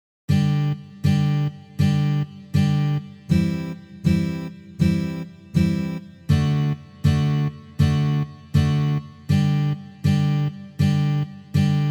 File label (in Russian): Udary po chetyryom strunam